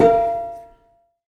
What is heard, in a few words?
Prepared Piano; Battery 3 Sample Kits